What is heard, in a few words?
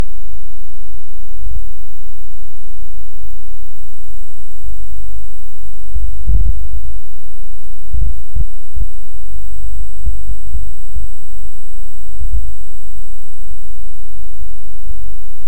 Hydrophone; OneOcean